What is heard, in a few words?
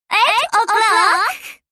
clock